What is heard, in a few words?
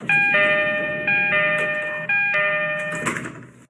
Door Close